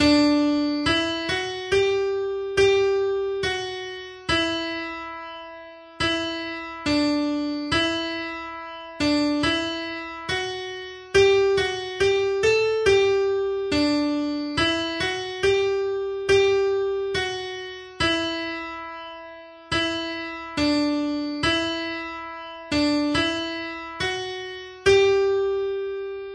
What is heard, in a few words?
slow Bagpipers